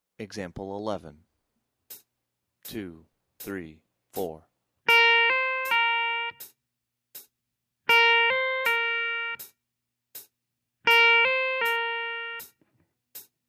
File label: slurs, blues guitar